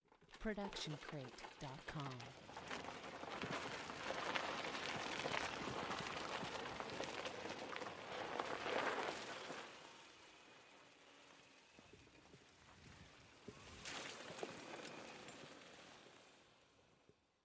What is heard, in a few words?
golf cart driving dirt close up on wheels; stereo; soundscrate